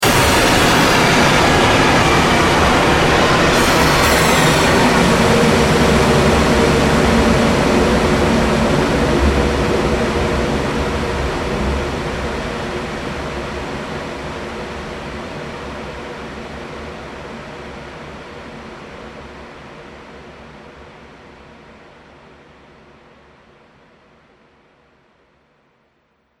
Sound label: Space War Destruction, science fiction sound effects